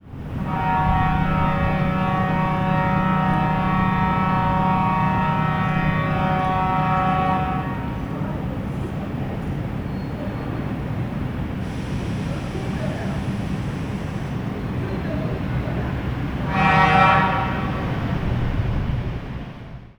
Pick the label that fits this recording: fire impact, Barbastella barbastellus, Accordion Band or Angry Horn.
Angry Horn